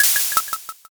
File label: syringe